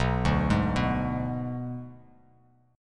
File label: B Minor 6th B; Bm6 arpeggiated; 7,5,6,4; JGuitar